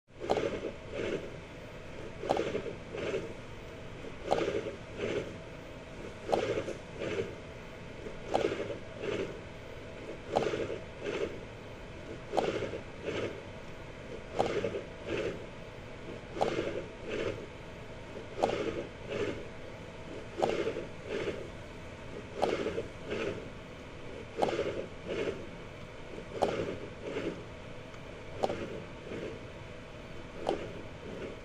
Heart Simulator; Interior, Muffled, Run Very Slow, Steady In / Out Breathing With Light Bellow Inflate / Deflate Some Room Tone; heartbeats